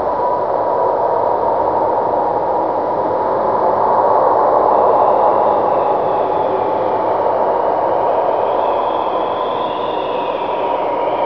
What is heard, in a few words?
chiller wind; quake2; kitsune